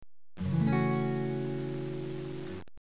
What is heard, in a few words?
F sharp 7